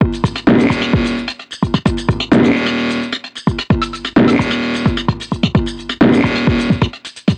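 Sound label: Messup Loop, beats; Sonic Foundry (Sony Creative Software); Esoterik Beatz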